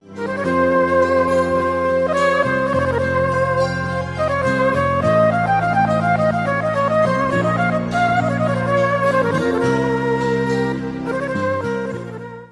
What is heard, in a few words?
harmony ringtone